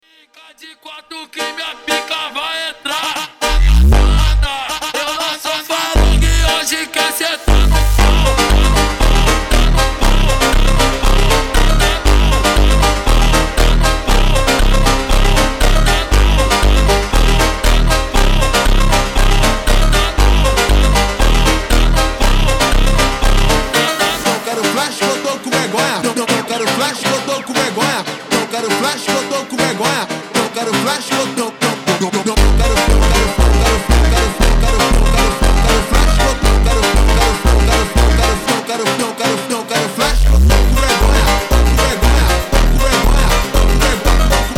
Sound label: Phonk MONTAGEM Ultra Slowed